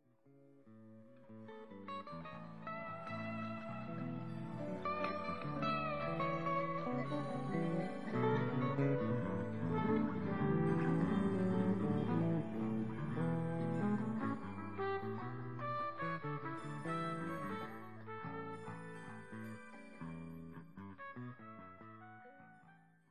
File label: Spanish Jam Windows startup Sound